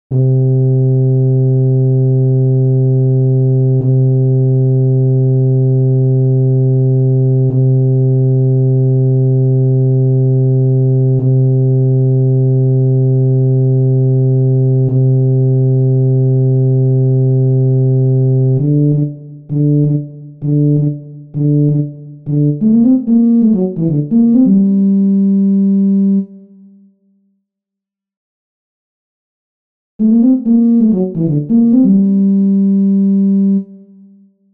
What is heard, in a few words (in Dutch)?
Euphonium